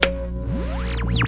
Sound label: radio startup; Radio FM synth type stuff